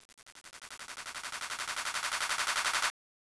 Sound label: pc clap